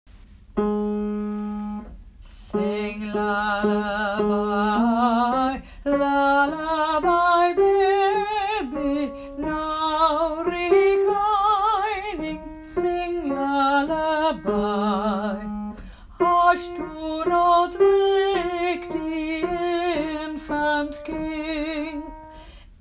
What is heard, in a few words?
The Infant King Alt